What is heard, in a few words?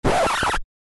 Record Scratch